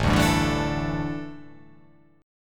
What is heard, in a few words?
A 7th Suspended 4th, A, down